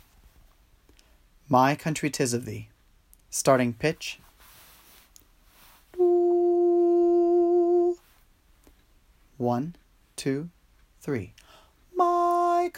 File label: my country tis of thee - vocal